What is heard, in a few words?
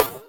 hat; Breaks N Beats Sound Kit; DnB Klub Kit